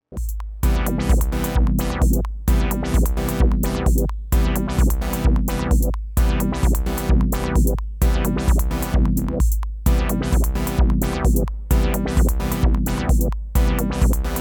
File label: Juno6 MC202; Rossum EM Control Forge